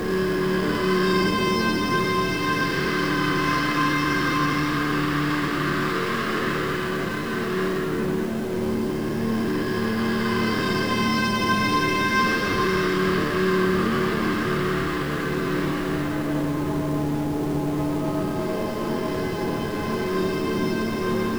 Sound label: yelling